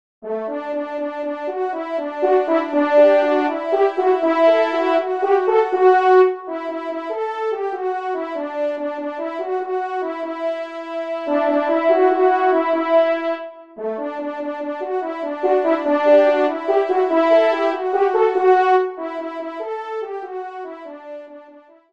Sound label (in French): trompe; Hymne Auvergne; Lac Aydat